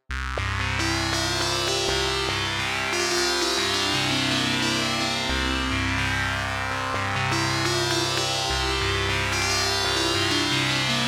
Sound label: JP8 Sitar; Jupiter8; OPXPROII